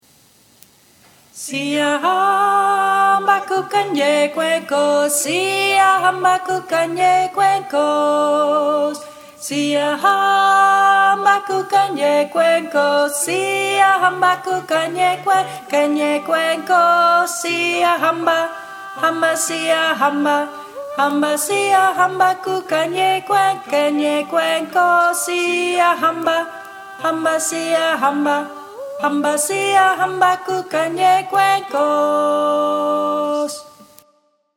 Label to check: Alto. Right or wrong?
Right